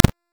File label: Fist Claw Spike Despawn; Warframe; Tenno; Incarnon